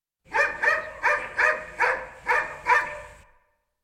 bellender hund; Auf dem Land

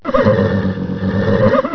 pferd

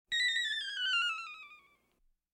ufos ausserirdische weltraumsonde; Science Fiction

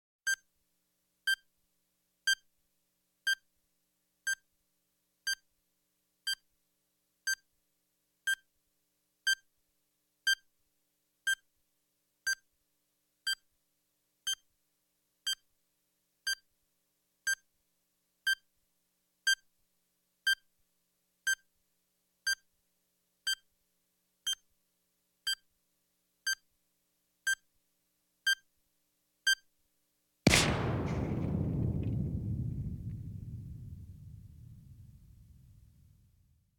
elektronischer zeitzünder, explosion; Katastrophen

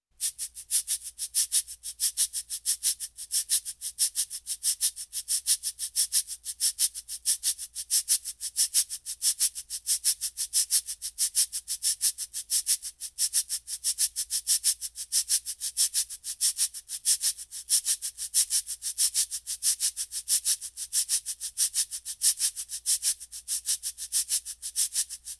cabassa rhytmus; Suedsee

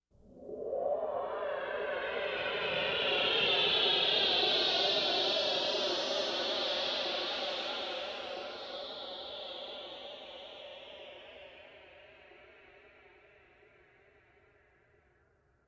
ufos vorüberfliegendes kleines raumschiff; Science Fiction

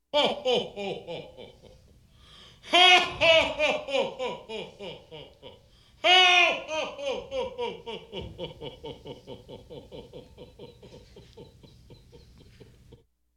weihnachtsmann, Lachen

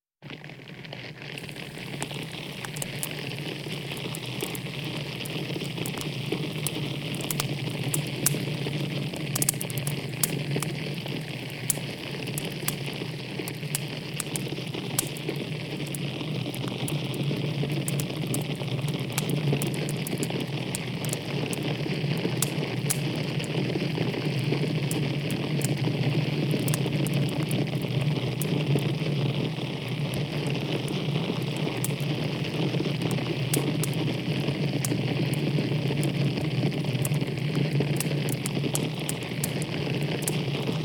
waldbrand schneesturm; Katastrophen

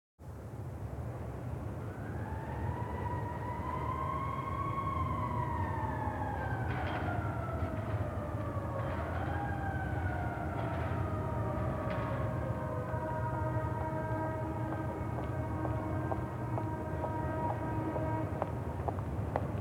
notarzt im verkehr; Großstadtgeraeusche

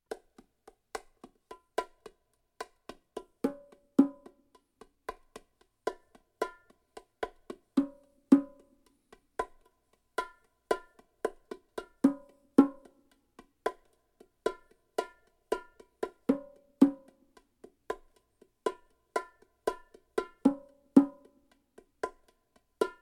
langsamer bongo rhytmus; Urwald

